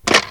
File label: household